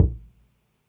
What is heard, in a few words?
selector Click; alohaxmas